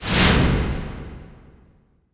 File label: IMP WIPE, Cinematic Impact